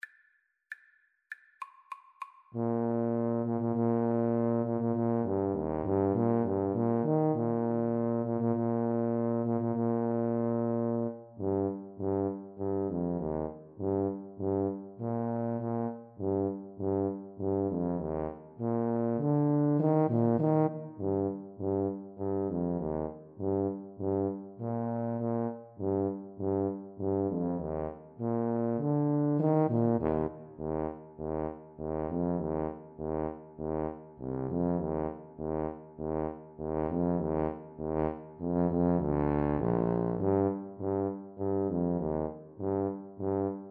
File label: Tuba Duet